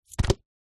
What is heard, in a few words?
tree log small rotten hollow drop or set down ground; foley